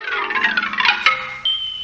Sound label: cartoon intro